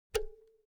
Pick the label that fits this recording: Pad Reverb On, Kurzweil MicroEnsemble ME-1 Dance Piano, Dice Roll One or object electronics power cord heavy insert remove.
object electronics power cord heavy insert remove